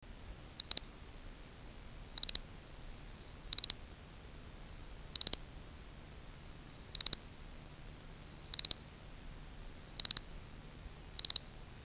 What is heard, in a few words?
Eleuthe auriculatus; amphibia